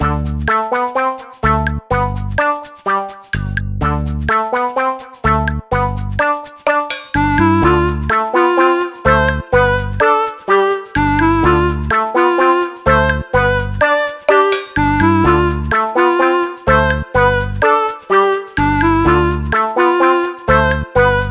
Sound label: Bgm Stereo Loop; Shin Hyu Stone; 3DS; Bgm Sungeki 32-16-Stereo Loop